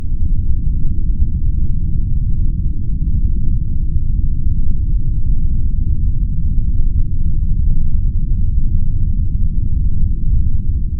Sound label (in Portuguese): RUIDO MARROM